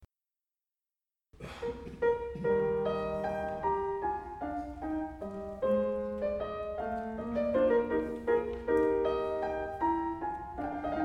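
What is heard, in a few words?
Piano Quintet Em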